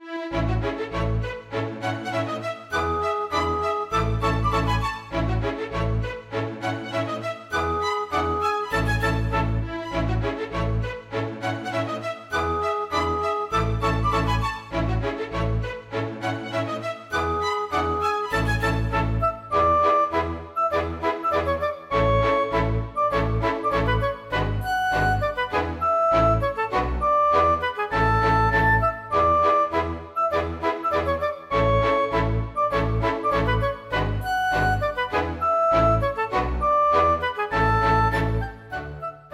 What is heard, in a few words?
Polka; Ursin; storgårdsmusikk